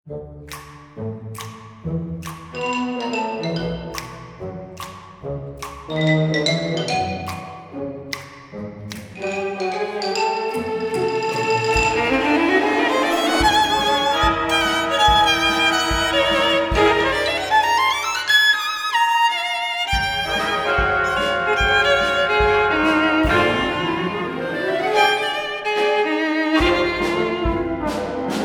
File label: Swing